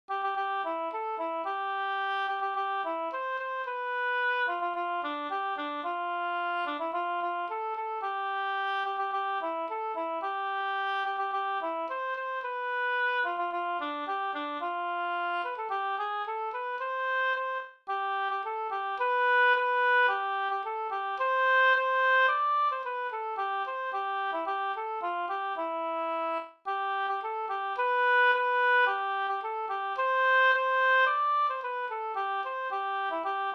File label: polka pariserpolka